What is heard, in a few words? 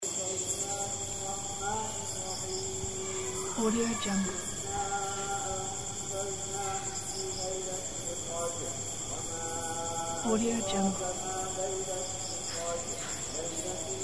muslim village jungle night short loop